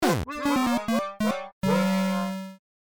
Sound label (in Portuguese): Morte